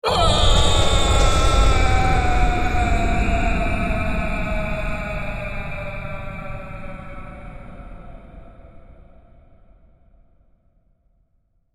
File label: Falling Down Shaft, Man screams when falling down futuristic shaft; Sci-Fi Voice